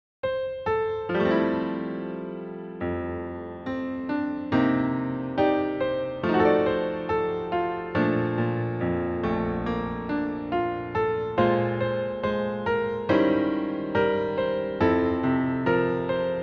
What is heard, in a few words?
Jazz Piano